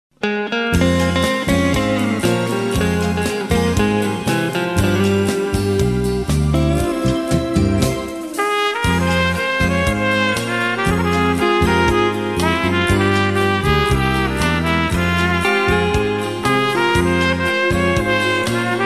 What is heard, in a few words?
Tango